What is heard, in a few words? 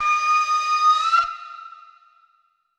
flute, ethno, 160, C